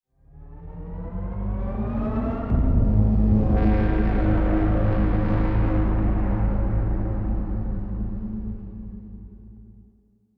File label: psi ship colony ship move; Stellaris; shroud; ships